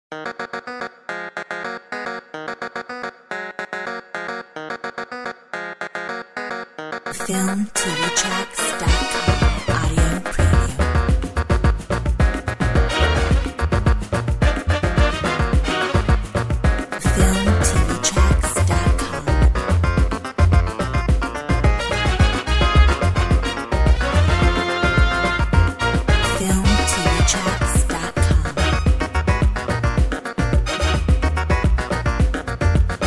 Funka salsa

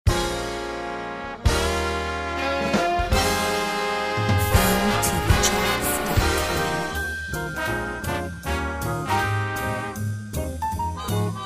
Christmas Swing

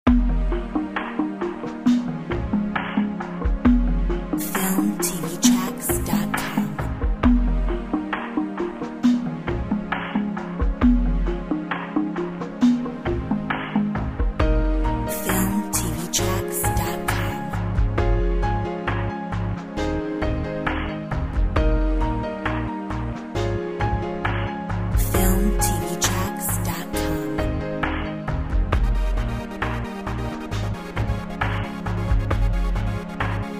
Corporate Branding

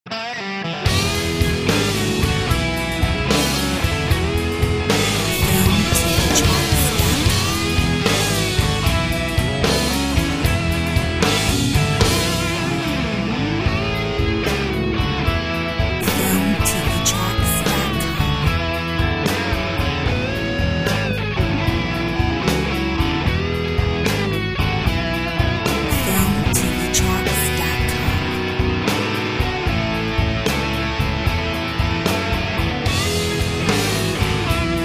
blues

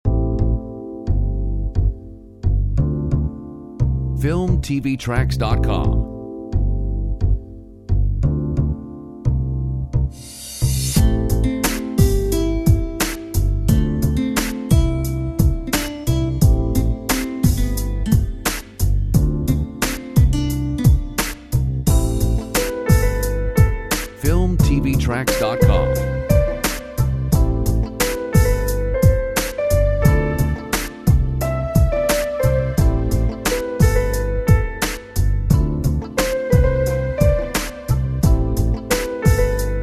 A bit of Smooth jazz; Filmtv-tracks

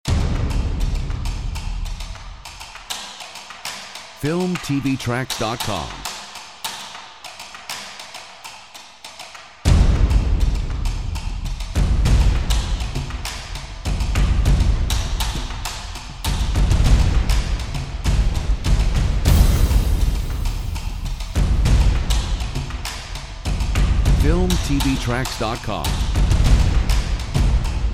Revolt Percussion

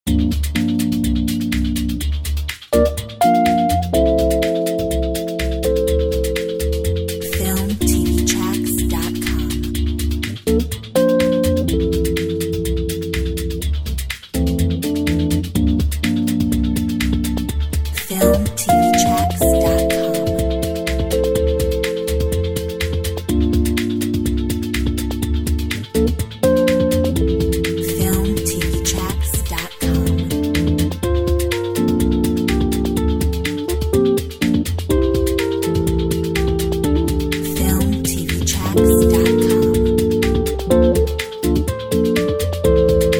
Summer Jazz

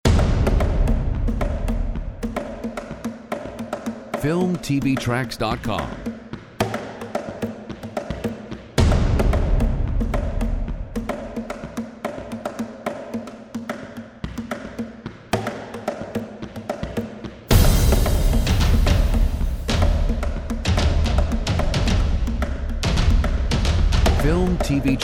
Tribal Percussion

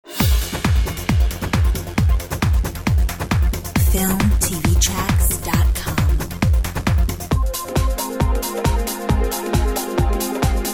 techno

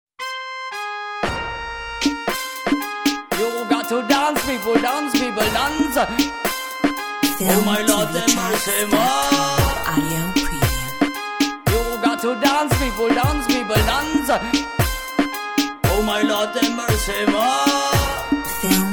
Dancehall

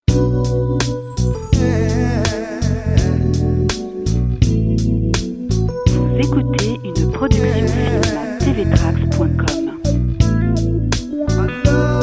Soul

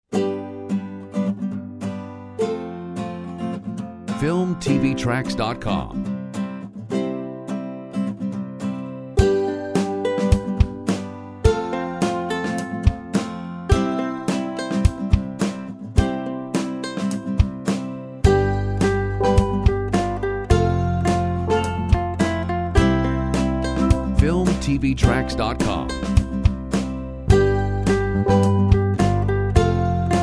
Ukulele tune; Filmtv-tracks

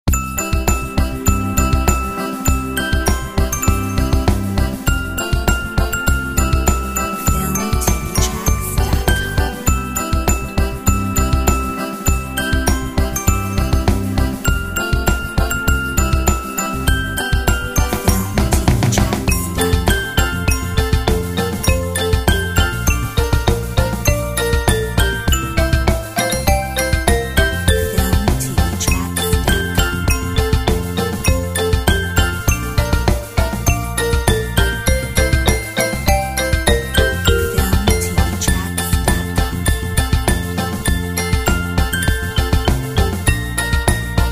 Jingle Bells reggae 100

